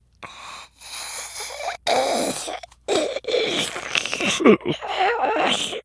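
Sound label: ambient zombie; cstrike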